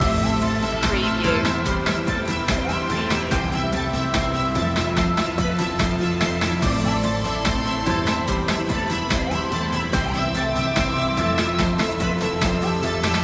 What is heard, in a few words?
reaching a goal loop